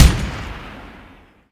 imperial carbine 1shot

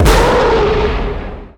combat atmosphere; World of Tanks

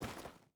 footstep boots stones walk; Rust

